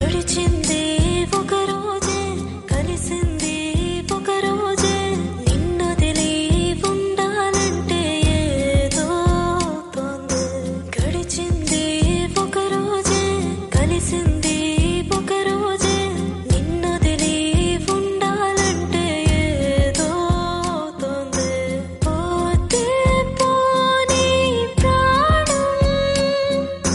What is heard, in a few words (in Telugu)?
telugu song